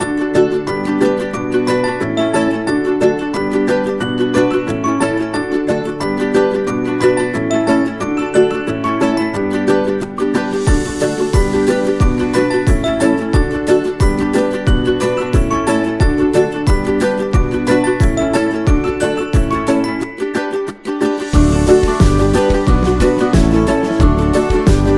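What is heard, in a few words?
Food, Upbeat and happy background music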